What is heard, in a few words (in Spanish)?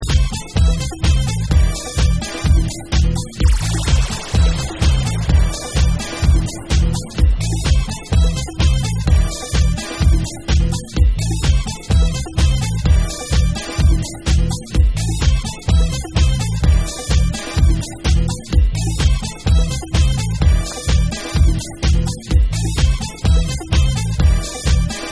Vocal Mix